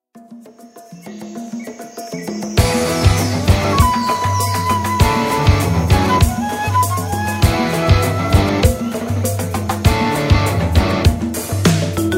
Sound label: Rainforest Instrumental; SonQuest